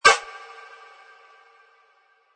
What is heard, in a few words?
Snare Arrow